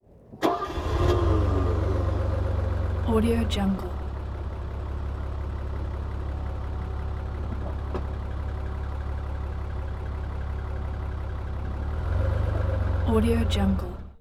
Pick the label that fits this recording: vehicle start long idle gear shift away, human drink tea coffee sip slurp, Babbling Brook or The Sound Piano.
vehicle start long idle gear shift away